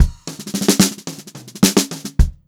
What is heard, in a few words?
109 GR FILL, Funk Groove Drumloops; USB Soundscan vol.38; AKAI; 90 s Sample CDs